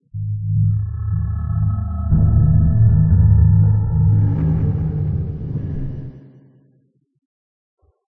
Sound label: Night opening; Five Nights at Ronald's